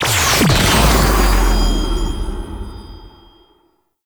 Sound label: Interrogation Start; Dax